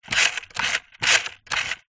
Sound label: leisure rc toy car wheel turn motor plastic